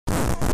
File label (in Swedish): record, GRAMMOFONSKIVA; BRYTLJUD